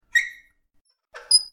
Closing Valve; Don't Be Afraid The First Toy

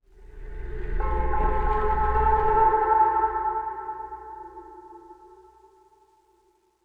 Dark Ambience Sting; dead rising 3 apocalypse edition

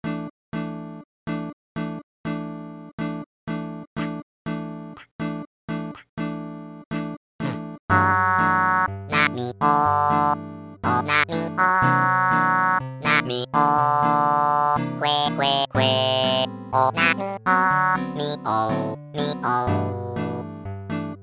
Bossa (LIVE); animal crossing full gamerip

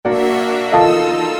Suspense Intro

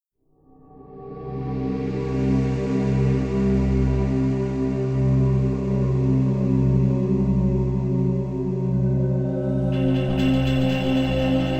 Unbalanced Ambience; osananajimi to no kurashikata arrange sound track

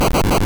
Rock Smash; sfx; attack moves; pokemon; gen 2; gsc

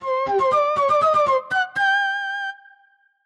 Flute Jingle

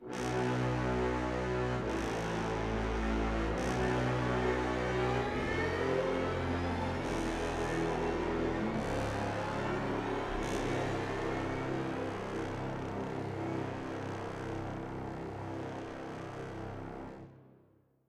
Stinger Evil Long; Pillars of Eternity

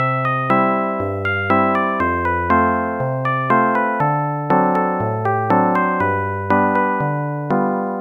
Ragtime; AdLib music synthesizer card demo songs; IBM PC XT AT

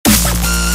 Dubstep

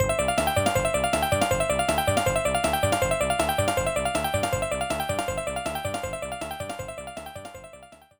Drum; Kakyuusei; Saturn

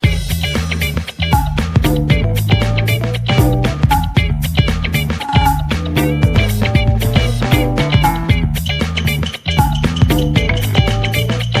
jingle funk; Meccha Taiko no Tatsujin DS 7-tsu no Shima no Daibouken

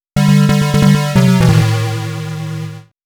Bonus Game Defeat; Fatal Fury; x68000

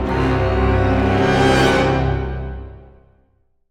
MUSIC Command Beacon Fail; SideStory; Batman Arkham Knight

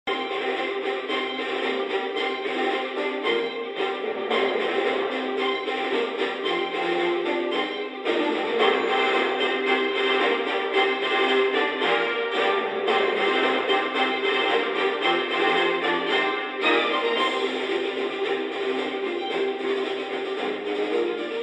Gameplay Music Radio; Tanksshock

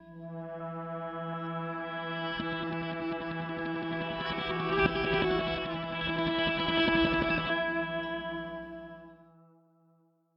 Sting; minecraft story mode gamerip 2016; Assembly Required; Boomtown

